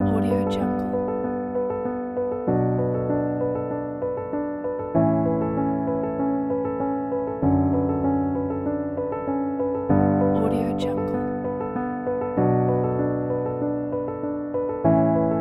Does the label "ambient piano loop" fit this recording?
Yes